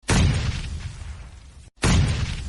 Bomb Sound Notification

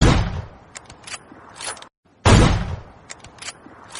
Awm Shot, Gun Shot Notification; Pubg

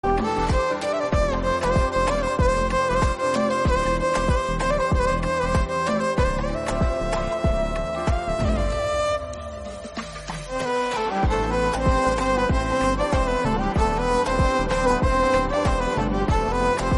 Voilin Cover, Instrumental

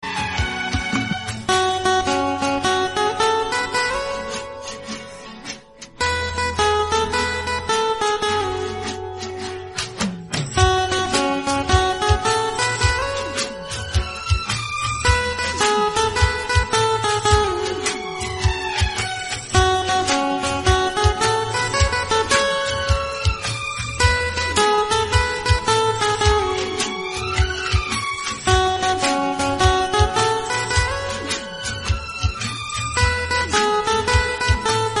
instrumental song

